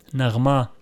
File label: melody